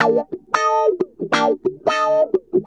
ACID JAZZ

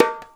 NAGARA; InVision Interactive; Percussion Slam; KWICKIE

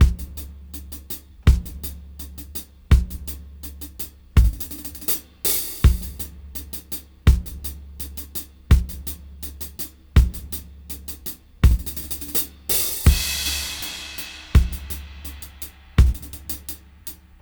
Gresy 82 BPM Kick Hat; AMG - Keith LeBlanc Phat Butt Jams

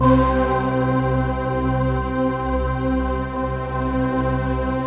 OBI VOX KORD, OBI CHORDS; Zero-G Ambient 1

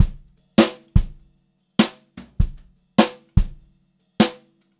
JZ VARI, Brushed Drum Loops, JAZZY; USB Soundscan vol.39; AKAI

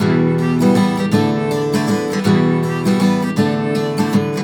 EMGTR; USB Soundscan vol.42; AKAI

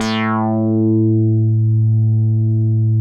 MINIMOOG

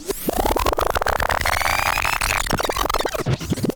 FX; AMG Megabass REMIX! VOL-2

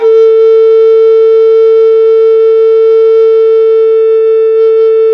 TEN A4, BAGPIPE; AKAI S-Series CD-ROM Sound Library VOL-2; TEN REC

